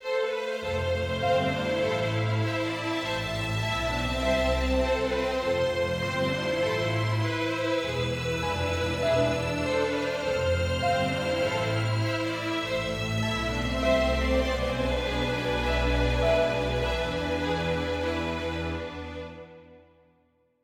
adventure forte; Quivr; PS4 Windows gamerip 2016

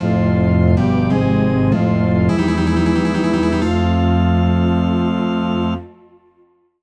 Jingle Mid Boss Intro; Mario vs. Donkey Kong

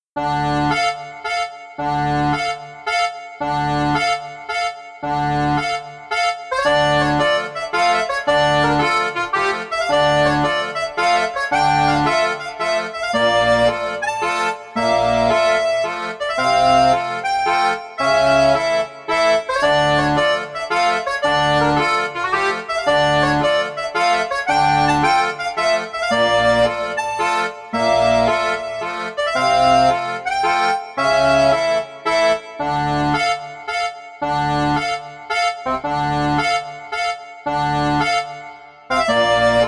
Accordion; Tenebris Mentis; Revita

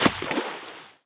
Lane crocodile

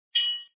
Sword Hit; Empires of Arkeia

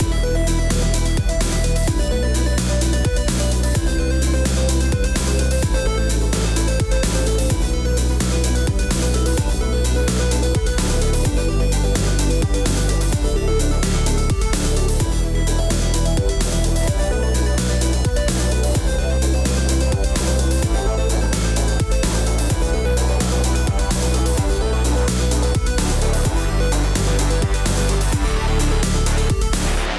Sonata; Zomborgs Sonata; metagalactic blitz

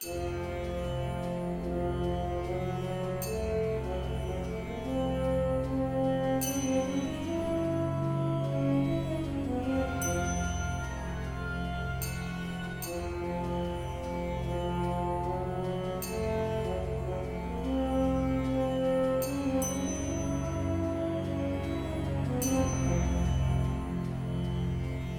Middle Eastern Travel; Mount & Blade: Warband; Android

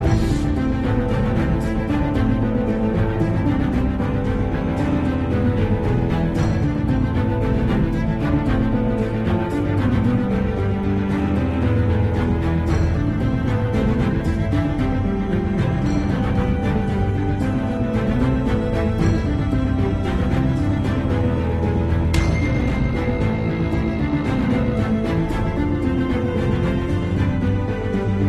Loop Action

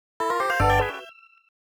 New Class Jingle; Reflect Missile

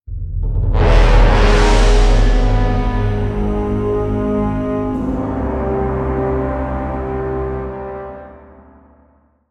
Grand stab

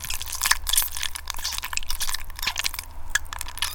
Flesh Move Medium

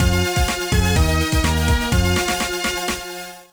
Level Complete!; Bionicle Matoran Adventures

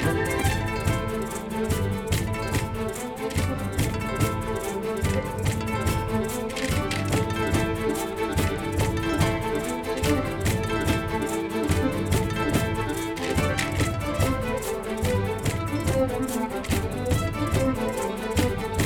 Music Ambiance Hostel Fight Loop; asterix obelix slap them all 2

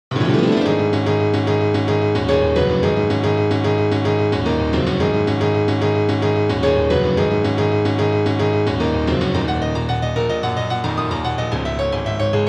Bike Chase (Piano Man Version)

